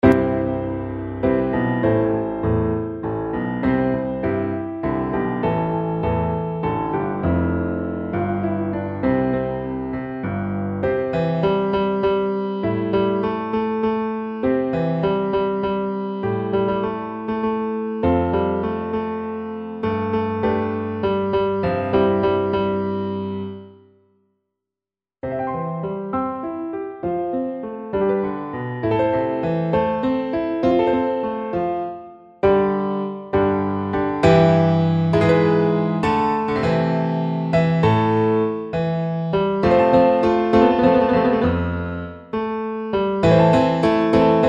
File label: Piano Cello